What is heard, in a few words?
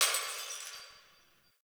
Distant Glass Breaking; Warframe; Ambience; Nineteen Ninety Nine